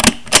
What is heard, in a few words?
drop reception; Starry Night Deluxe